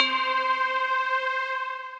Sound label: stringbell; Bells & Mallets; Work Stringbell; Ableton; Factory Packs; Beat Tools